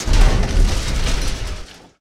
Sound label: Boom; Peace, Death; Fatecard